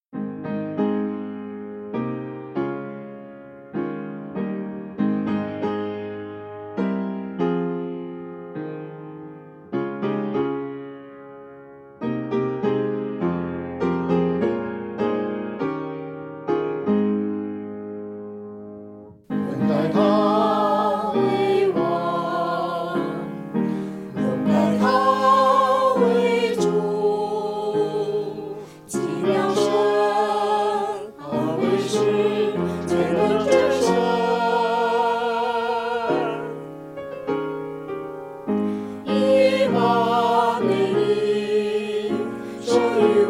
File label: Choir